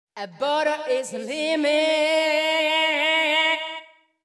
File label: VOICE GRL HOUSE 125 A, House Voice